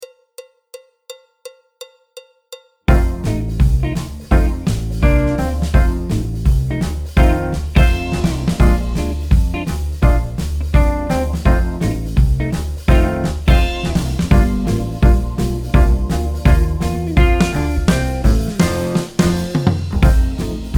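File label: backing track, Jazz; alto, flute, trombone, piano; Jazz Syllabus Level 1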